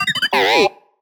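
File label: Sci-Fi Droid Robot Emote Neg; JASEM: Just Another Shooter with Electronic Music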